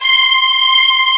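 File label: ens strings C3, ensemble strings; ens strings C6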